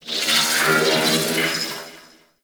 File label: Ship Metallic Robo Chatter; Warframe; Corpus Ship Remaster